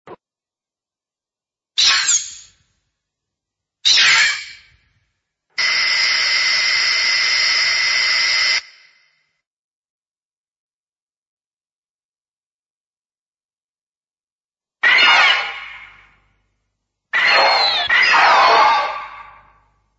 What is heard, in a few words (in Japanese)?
koe, mosura